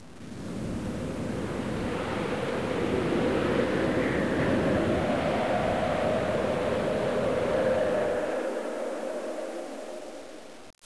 wint wind

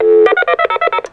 phone, ied

